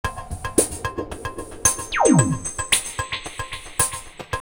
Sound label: 112, drumloops, DrumMachines; X-Static Goldmine